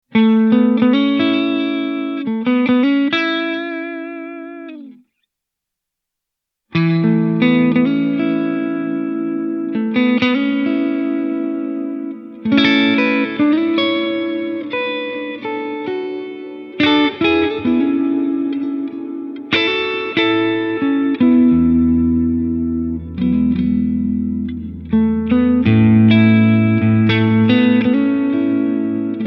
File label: Plate Classic Long, reverb